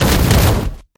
metal hard land; Original TK Sounds

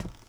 ceramic step; Golden Crayon Sounds

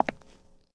concrete step; Golden Crayon Sounds

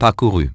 completed; iOS 4

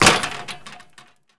wall metal; Golden Crayon Sounds

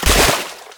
water fall; Golden Crayon Sounds

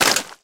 fire land; Golden Crayon Sounds

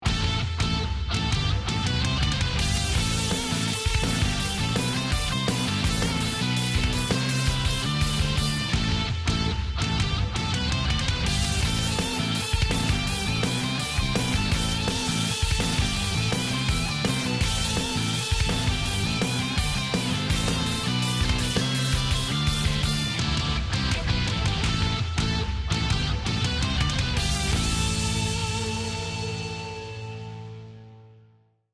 main music; RS Games Sounds

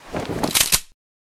shotgun draw; Original TK Sounds

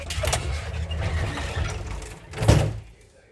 door open; Golden Crayon Sounds

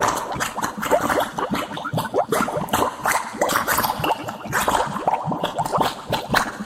quicksand; Golden Crayon Sounds

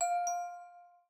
ding dong; Business skieme Beta 1

